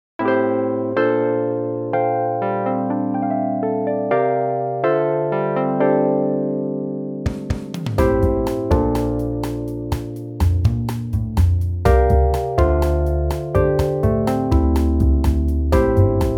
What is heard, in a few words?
radiowaves inst